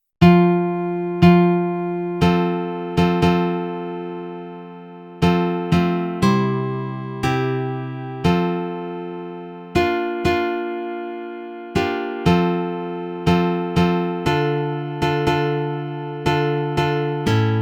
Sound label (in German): F-Dur Nylon Guitar